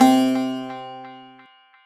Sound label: baglama do; baghlama